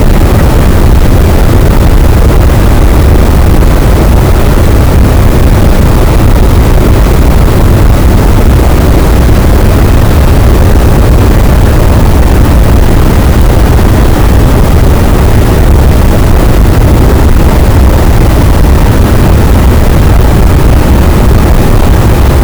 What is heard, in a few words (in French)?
cachalot, gaussian noise; Physeter Macrocephalus